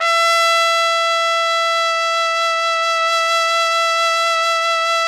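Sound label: trumpet; Roland - LA Composer vol. 3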